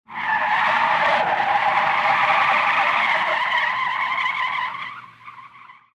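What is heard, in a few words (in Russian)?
сильный визг шин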